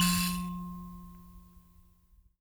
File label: HI KALI F 3, HI KALIMB; Heart of Africa